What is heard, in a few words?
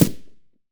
m4a1 silencer mono far, weapons